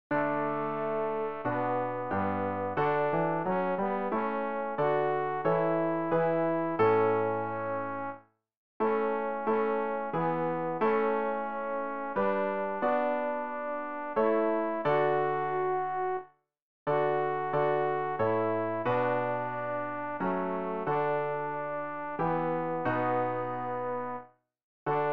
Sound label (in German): tenor; Ref. Gesangbuch; RG 562: Die helle Sonn leucht' jetzt herfür